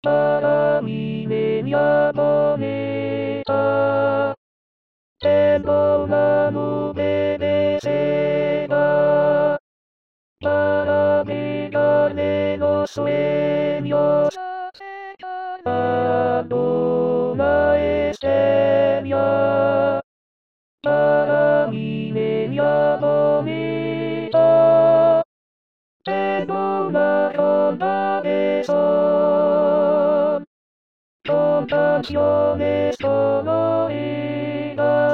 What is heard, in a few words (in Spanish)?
CONTRALTOS VOZ